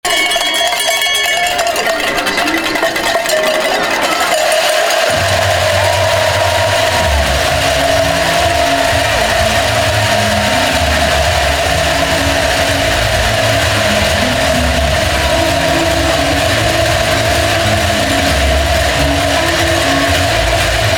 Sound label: 4th Quarter Sound